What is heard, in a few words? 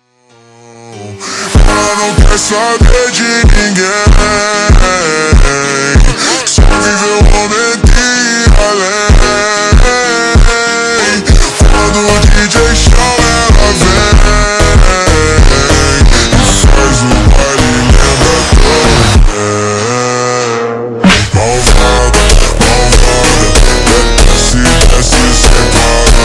ultra Slowed, funk